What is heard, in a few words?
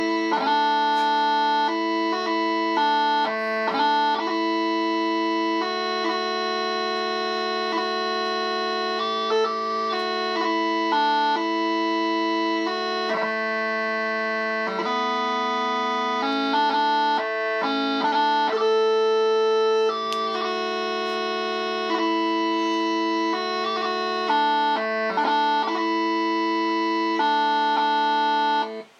bagpipe tunes; weddings